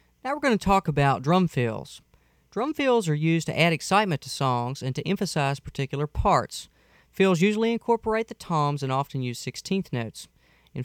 Fill

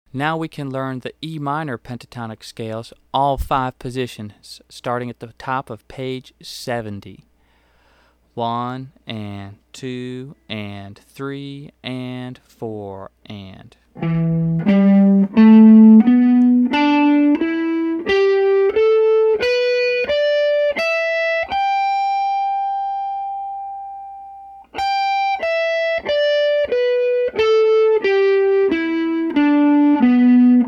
Em Pentatonic 1st Position

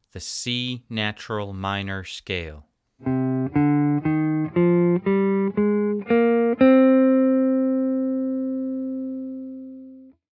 C Minor Scale

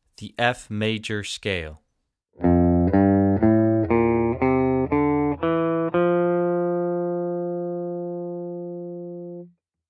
F Major Scale

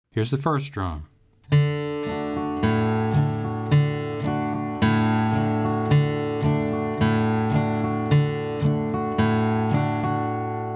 Strumming

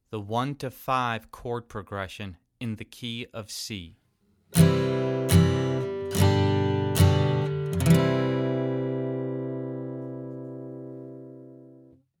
1 6 2 5 Chord Progression

